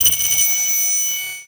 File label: Transition Feedback Ring